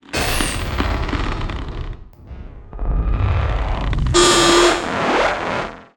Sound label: alien synthetic texture; BlueZone; Alien Spacecraft Sound Effects